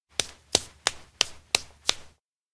slap skin; anemoi

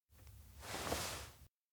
point wall; anemoi

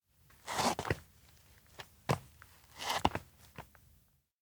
steps outdoor residential backstep fear; multiverse; rikka; anemoi

